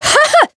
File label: Voice attack; orleya